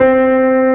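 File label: reverse piano C2; AKAI S-Series CD-ROM Sound Library VOL-2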